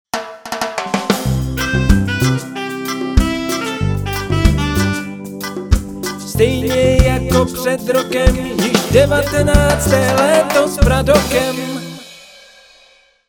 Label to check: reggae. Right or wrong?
Right